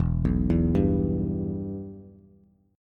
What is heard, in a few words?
E-Minor, Bass; arpeggiated; JGuitar